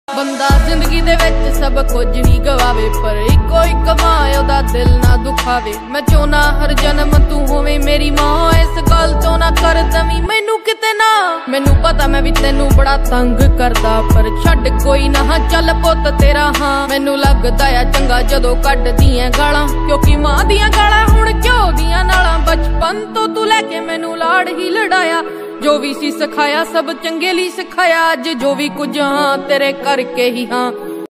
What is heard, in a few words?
Punjabi ringtone